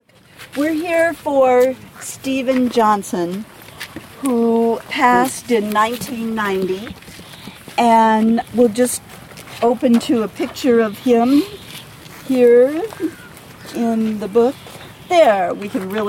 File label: soundscape tree planting memorial; english